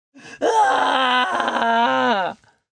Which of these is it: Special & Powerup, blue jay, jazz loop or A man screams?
A man screams